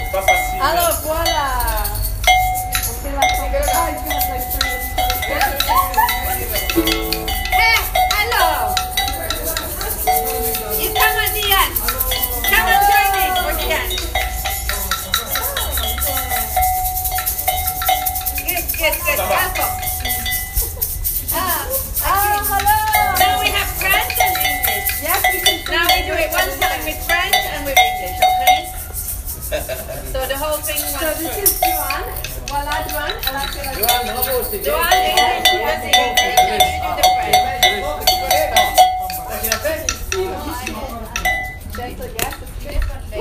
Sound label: sous la pluie runthrough in french and english; lets dance in the rain